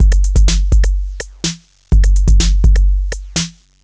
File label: drum under full 125, Drum Loops, Classic Chicago House